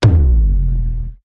Hit Tonal Plunging Bass

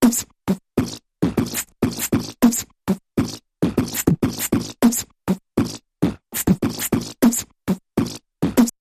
Big Mouth Percussion Variation

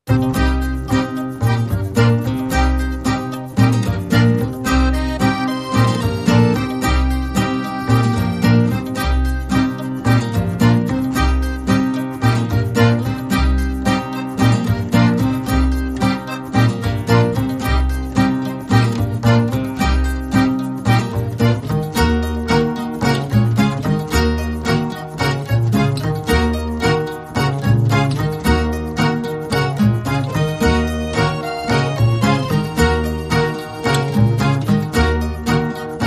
Romance, Background

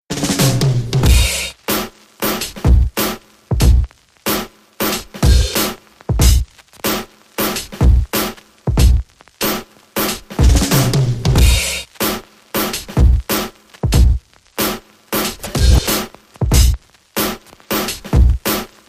Bubbles Beat

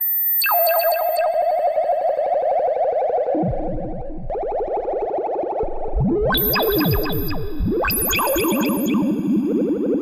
Space Bubble Pulse

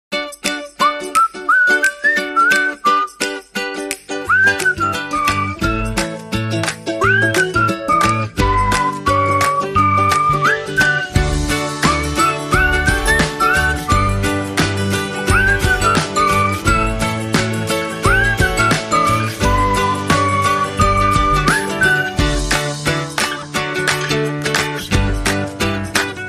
Clap Along With Us

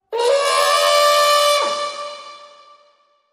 Elephant Blues

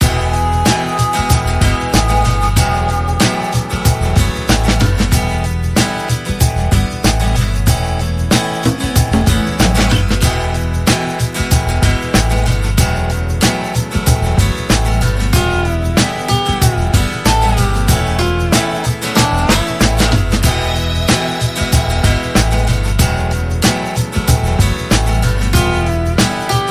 Fire Raising Funk

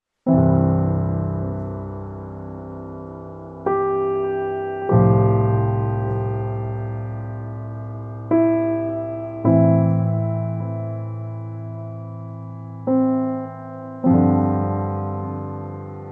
Piano Only

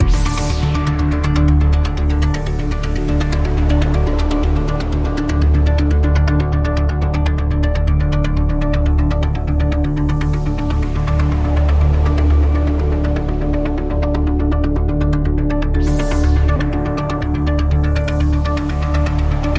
Radio Toolkit Pad

